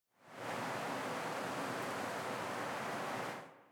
Victory Wind; Smite; Susano

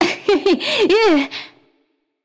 "yay"; smite; voicepack; nuwa

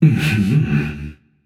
agree, voicepack; smite; jorm

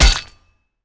basic hit; smite; osiris

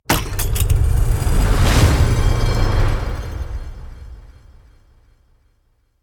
pedestal open; smite

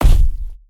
footstep; smite; atlas

